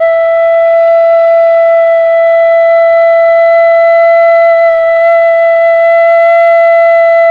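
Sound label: khlui E4, Thai flute; Spectrasonics; Heart of Asia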